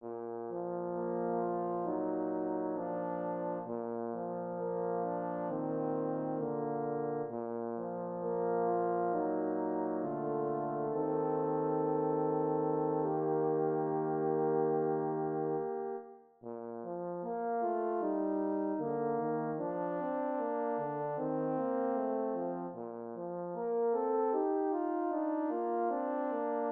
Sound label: horn 4tet